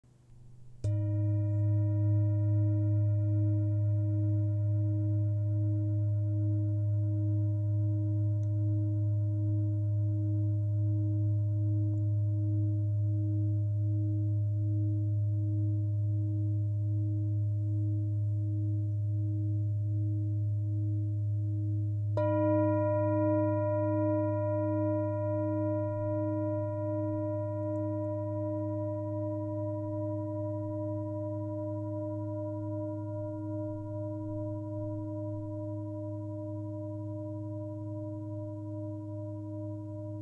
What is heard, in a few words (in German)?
Goldene Fische Klangprobe